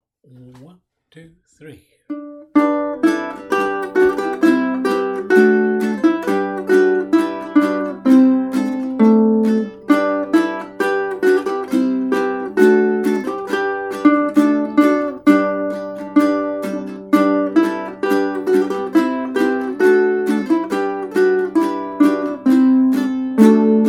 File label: Uke 3 Practice; Bransle Des Chevaux